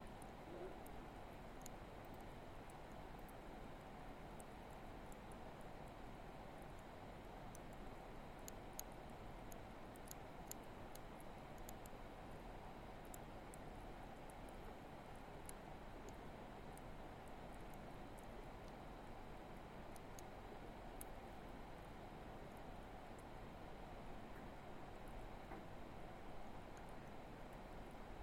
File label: noise